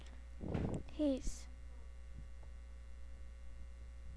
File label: hiss